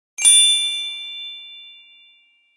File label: starfull